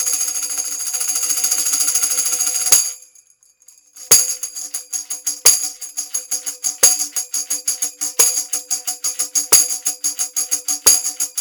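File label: tambourine; LCT340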